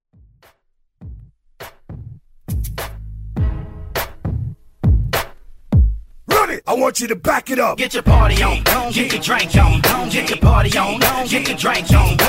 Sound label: OG To Moombahton Hype